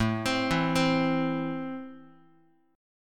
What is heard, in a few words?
A Minor A down Guitar; JGuitar